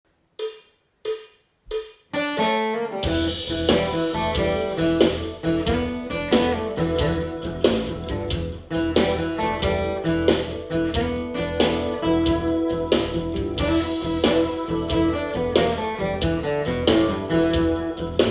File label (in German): Blues Solo E; Level5